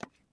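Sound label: wood run left, footsteps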